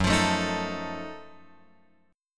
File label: F sharp Minor Major 13th, guitar; F#mM13; {2 X 3 2 4 5} strummed